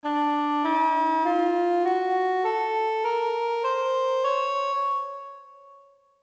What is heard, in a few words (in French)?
saba, Gammes arabes